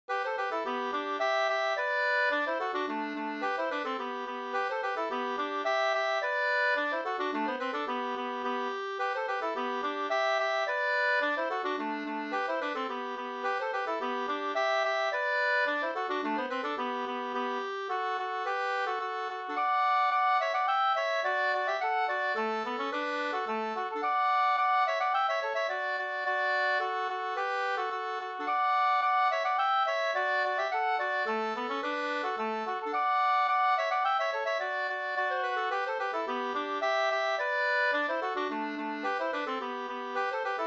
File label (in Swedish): polka, 2st; e Oskar i Bogsta Sd